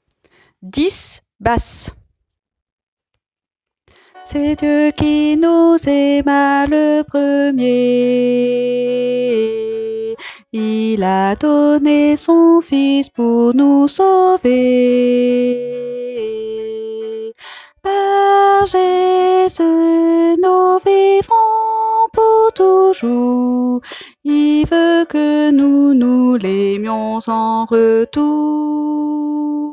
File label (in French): basse